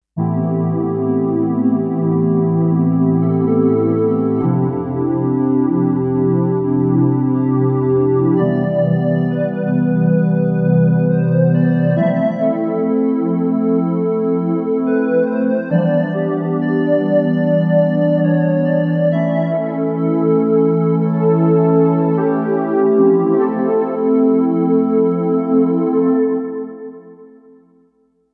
Pad, Kawai K5000S; factory presets; ClassPad

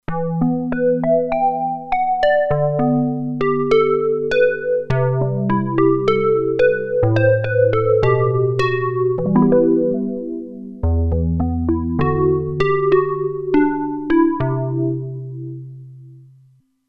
Chorus Pluck, Roland Alpha Juno-2; Original Sound Chart patches

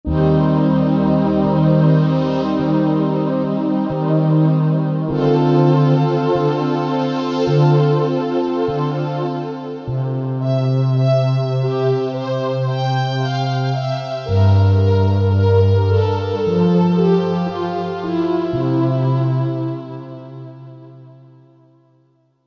2 voices; Korg MSC-12S Synth 3

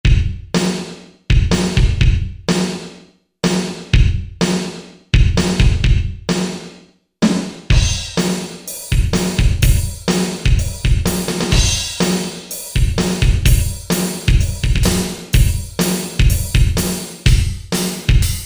Room Kit, Korg MSC-2S Synth; Synth 1

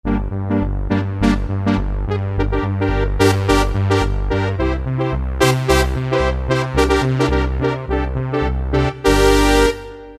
Velo-Brass, Roland D-50; Factory preset demos

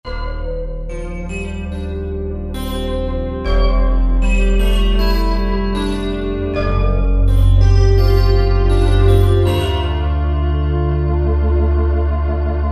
Digital 2, Korg M1; Factory preset examples

